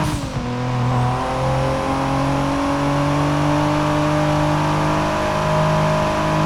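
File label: fourth cruise, car engine; sgmcars; lancer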